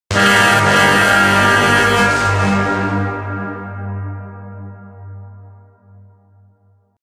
Time Out Jingle; Metroid Prime Federation Force; blastball

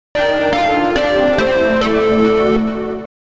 Appearance Jingle; Samus; Metroid Prime Hunters

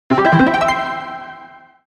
Collected Jingle; Metroid Prime Federation Force

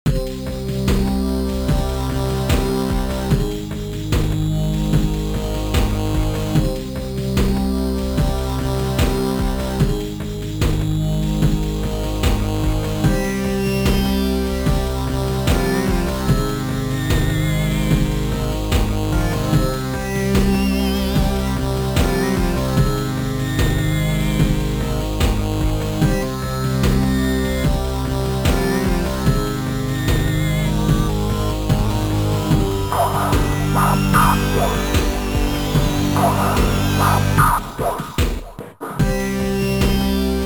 Menu; Single Cart; Metroid Prime Hunters

